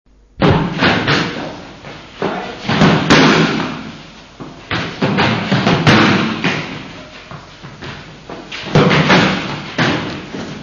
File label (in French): ambiance bruit ballon